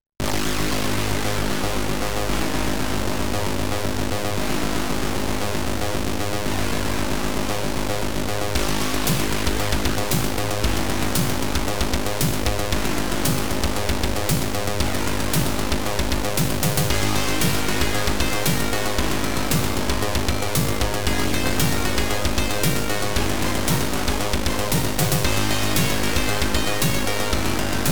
OPL3; CYBSPHERE

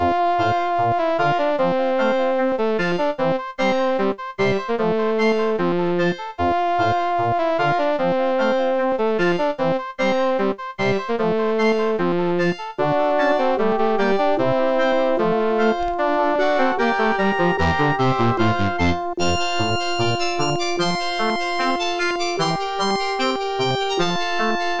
OPL3; Tetris

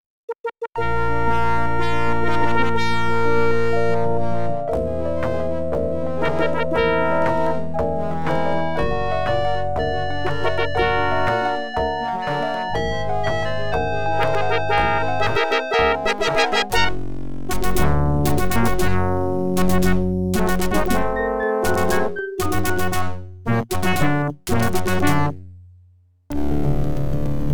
OPL2; X WING

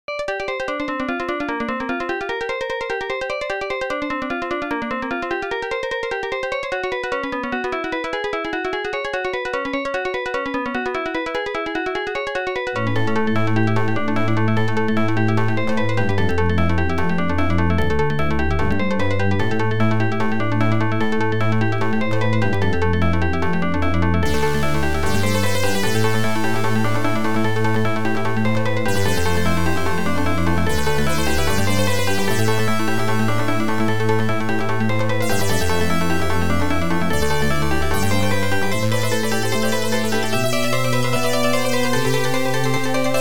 OPL2; Tyrian

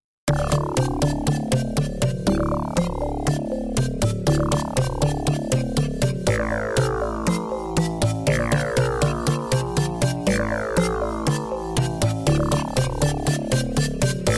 OPL2; Yamaha OPL2; Jill of the Jungle

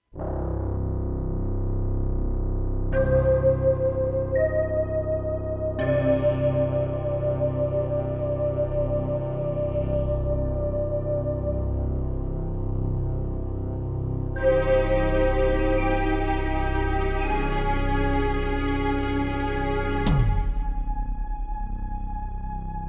SC880; Dune 2

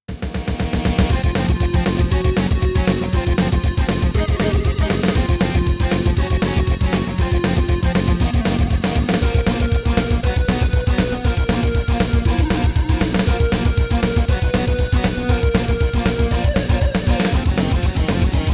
SC880

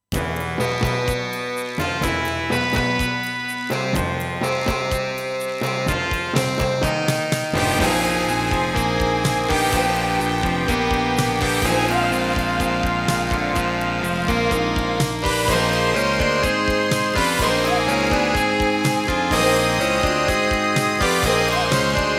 SC880; QFG3

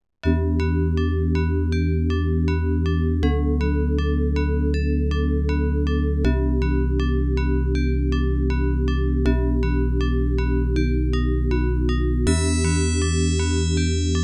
ADLIB OPL2

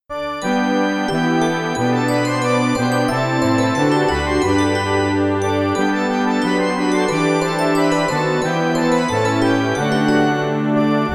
CM500 MT32; ULTIMA6

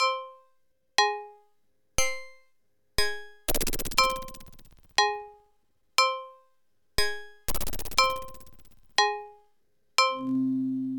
OPL3; sim city 2000

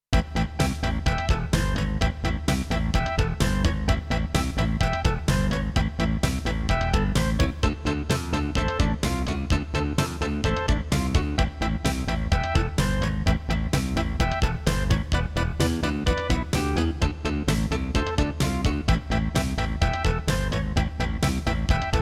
CM500 GS; LSL3

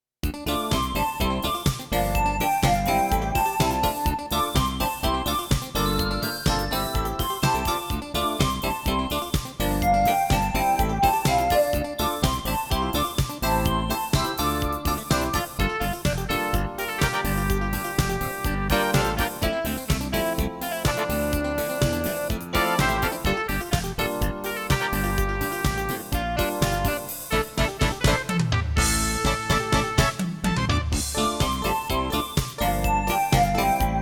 CM500 GS; LSL3

